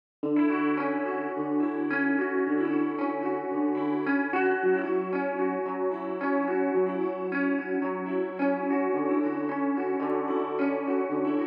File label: guitar